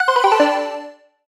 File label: Ui Shop Close